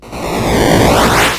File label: Arcade Riffs